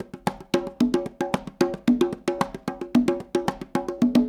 Raw Funk Congas, 70s Analog Funk; Sonic Foundry (Sony Creative Software); What it Is! 70s Analog Funk

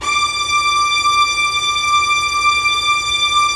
STR SEC, STRINGS; Roland - LA Composer vol. 1

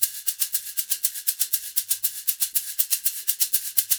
120 SHAK, Percussion Loops; USB Soundscan vol.36; AKAI; SHAKER

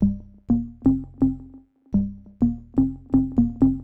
Organ chops (125BPM), retro house

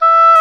Oboe; Oboe f; Roland L-CDX-03 Disk 1; Oboe 4-8

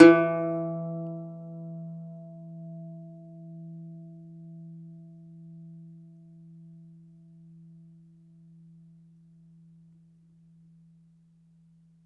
DOMRA, Segundo Domra, Plucked Strings

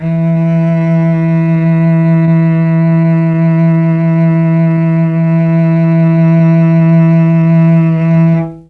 E2 300 CELLO, Mellotron, STRINGS; Mike Pinder - Mellotron Archive CD

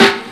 Trashy Snare; Mo' Phatt; Digital Sound Factory Mo' Phatt

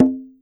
conga hi; E-MU Producer Series Vol. 7 – Old World Instruments

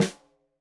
Snare Stereo, Drums; Deeply Sampled Drums; SMDrum

